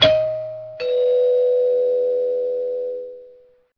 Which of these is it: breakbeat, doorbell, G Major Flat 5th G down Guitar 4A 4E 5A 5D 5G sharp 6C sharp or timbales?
doorbell